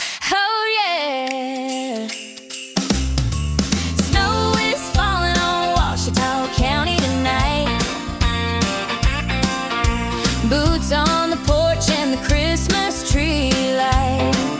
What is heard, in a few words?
Upbeat Country Christmas